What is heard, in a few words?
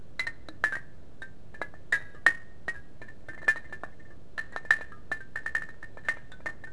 woodchimes; The Elder Scrolls III Morrowind GOTY; XBOX